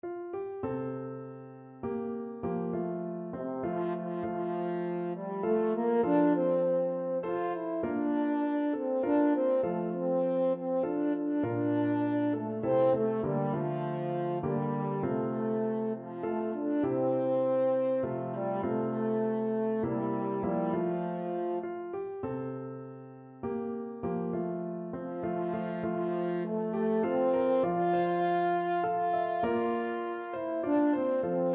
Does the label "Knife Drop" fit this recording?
No